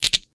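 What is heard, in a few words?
Canyon Random Critter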